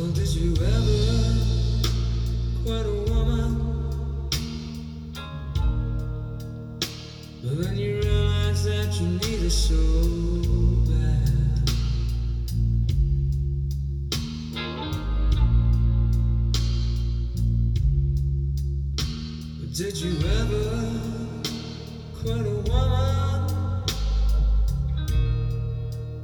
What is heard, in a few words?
blues